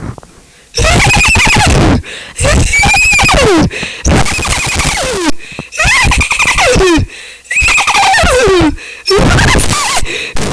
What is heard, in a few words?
Eheheh